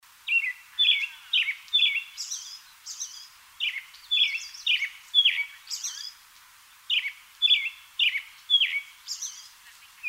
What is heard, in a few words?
American Robin Turdus migratorius